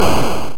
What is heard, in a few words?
explosion